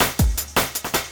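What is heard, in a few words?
loop snare drum, drum & bass, 160 BPM; Ueberschall; Drum & Bass Resonance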